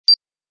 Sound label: ringtone